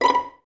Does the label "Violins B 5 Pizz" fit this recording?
Yes